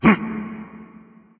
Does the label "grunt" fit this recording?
Yes